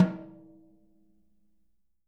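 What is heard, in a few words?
jazz snares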